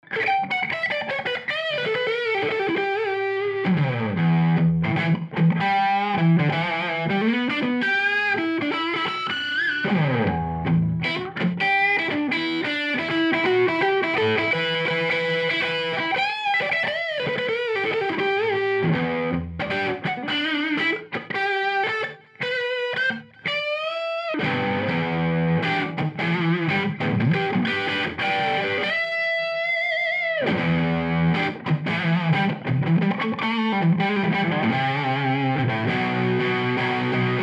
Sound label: Hyper Vintage Neck Overdrive